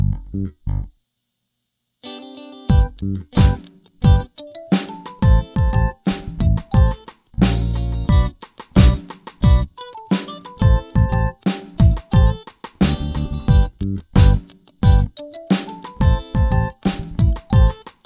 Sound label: back pocket groove